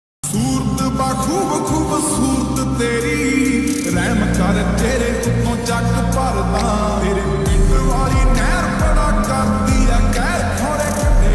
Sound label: punjabi ringtone